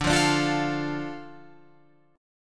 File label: D Minor D Guitar Open F; 14,12,14,12,12,12; Dm strummed